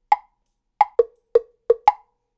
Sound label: Temple Block, Latin Percussion; Sonic Foundry (Sony Creative Software); Temple Blocks